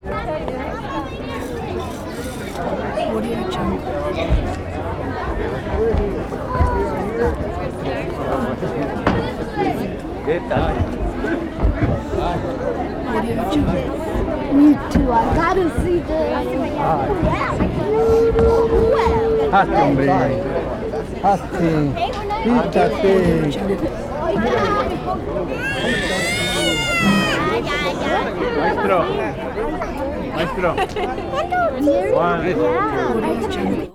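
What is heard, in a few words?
mixed walla constant close up voices pass by; prosoundfx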